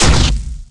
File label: Laser Impact; Space; Ranger